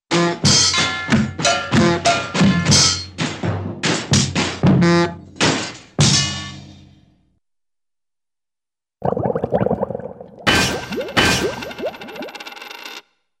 Several Various Metallic Impacts & Bulb Horn Honks, cartoon; Hollywood Edge; Cartoon Trax